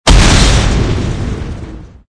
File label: Target baozha youtong; snipershooting; ShootSniper